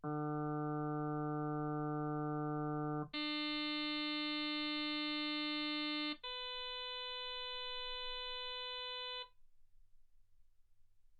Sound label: harm sinus 48kHz